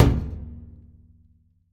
udar molotkom o tupuyu poverhnost

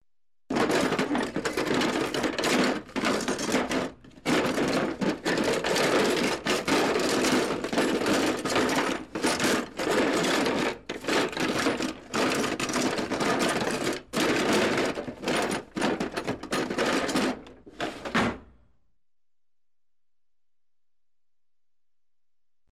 poisk v vidvizhnom yashike grohot

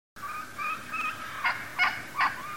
indeika dalekii zvonkii visokii

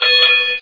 neterpelivij grubij zvuk zvonka

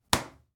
poshechina hlopok po licu

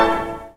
zvuk kompyuternoj oshibki

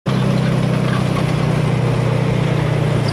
zvuk raboti dvigatelya furi

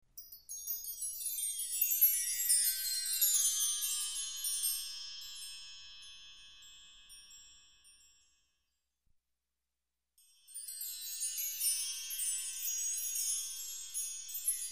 magiya magicheskij zvuk

